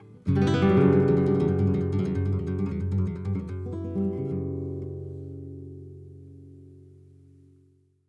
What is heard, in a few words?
BPM 94 Bm Flamenco Outro Chord